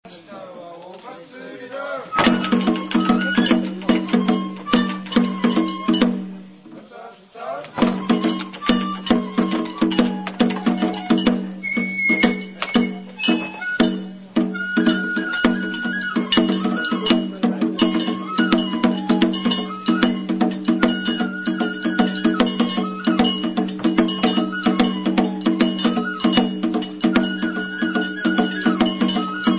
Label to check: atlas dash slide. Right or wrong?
Wrong